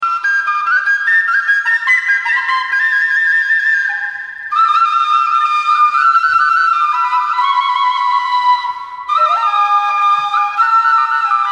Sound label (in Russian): Kuraj tatarskij nacionalnyj duhovoj, Tatarskaya narodnaya melodiya; Tugan tel